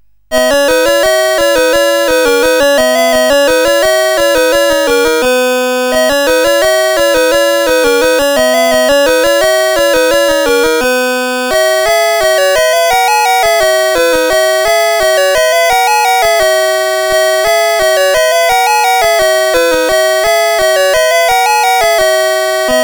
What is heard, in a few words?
Musette, sid CSG8580R5; Music Shop